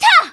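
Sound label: MON ATTACK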